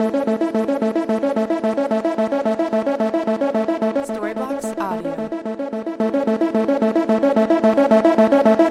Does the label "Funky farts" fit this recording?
No